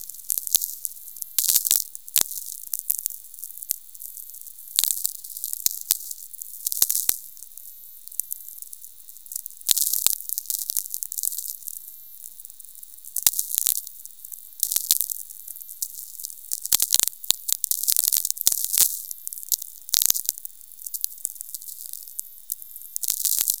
thunderstorm near, VLF

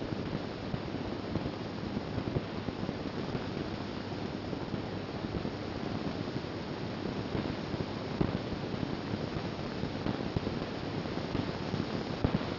Noise burst shaped

